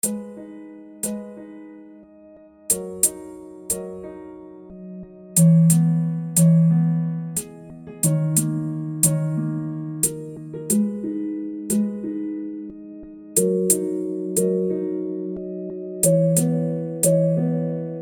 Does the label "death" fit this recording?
No